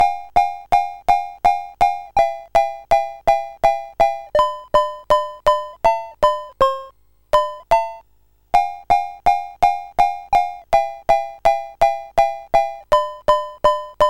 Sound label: BASIC sid CSG8580R5, GAMES; Shooting Gallery